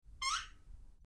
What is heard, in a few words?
Creaking Door; horror sfx vol 1